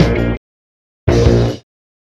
guitar, Loops

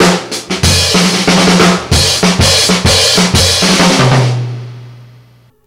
Drum loops, Rolls

